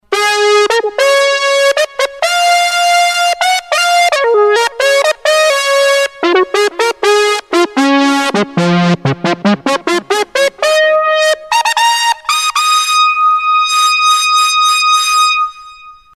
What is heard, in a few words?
Red Lead